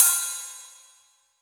crash one shot g sharp key, Royality free crash cymbal single shot tuned to the G# note; cymbal drum samples